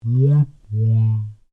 Created Creature Large Vocalise, Alien Sound Effects; Created creatures, vocalisations and pretend speech